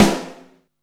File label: SLAP ROOM, SNARES; Back In Time Records; Big Bang